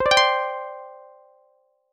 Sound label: levelup; python-learning